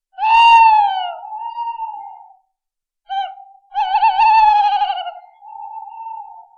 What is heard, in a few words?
Whoop Sms